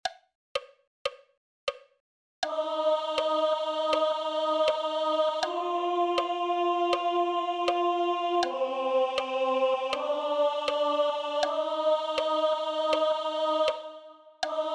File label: Alto; LA CHORALE ANARCHISTE